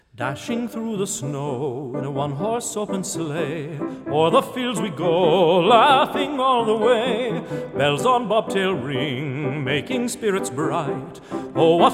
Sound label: Jingle Bells; Celebrating Christmas